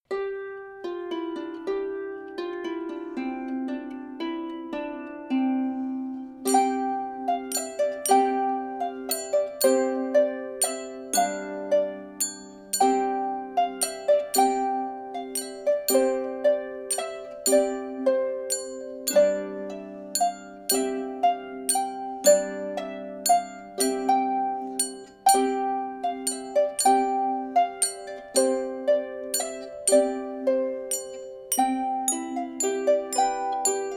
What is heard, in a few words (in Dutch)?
zonder zang